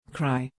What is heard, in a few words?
cry